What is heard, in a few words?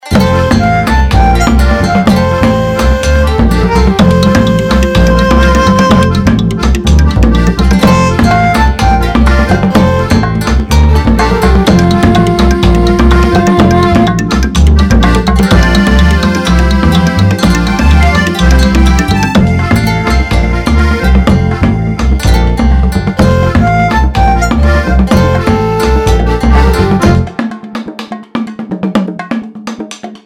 world beat friendly